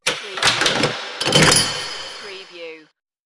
Scifi Production Element - Space Rattle

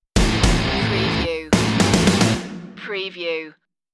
drop the hammer stinger

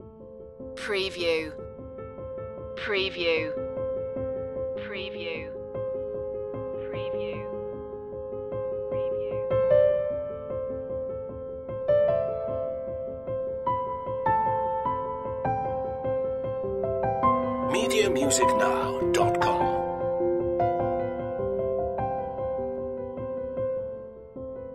love and heartbeats loop

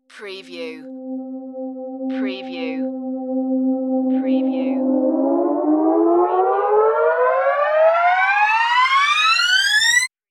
Long High Rise

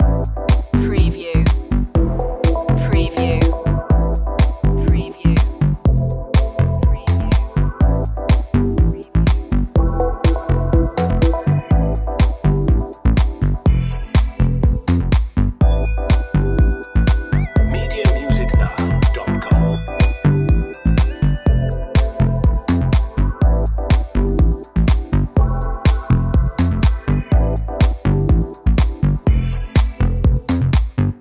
funky loop

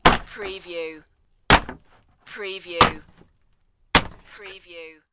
Wood Smashng

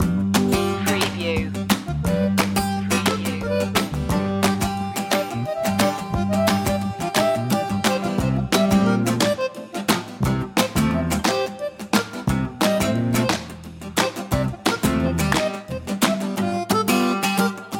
creole loop

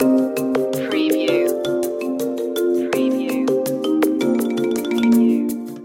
rainforest loop; central america

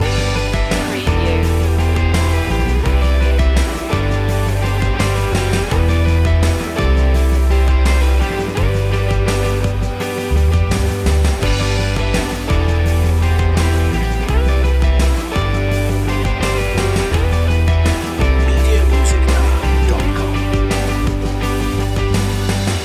pop anthemic song loop

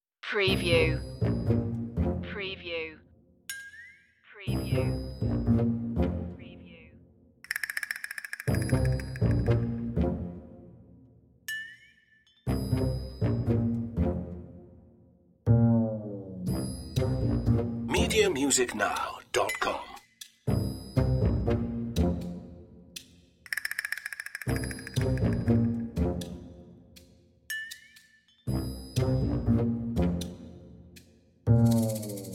bass and perc

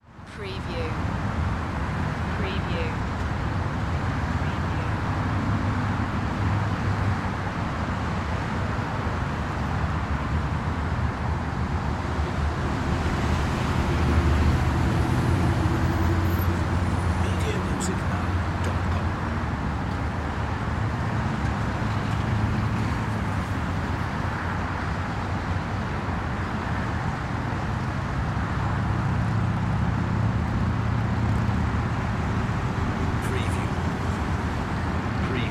City Traffic Heavy

